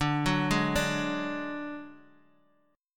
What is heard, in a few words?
D Major Flat 5th Guitar; arpeggiated